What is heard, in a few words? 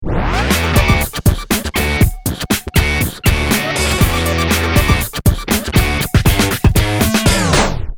speed up down